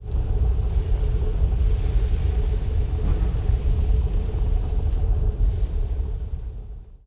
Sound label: planet heatup; stellarites; Stellaris; guardians